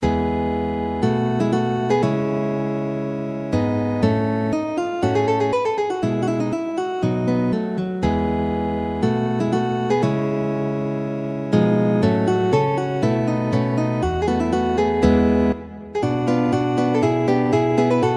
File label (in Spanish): duos dos guitarras largo; Carulli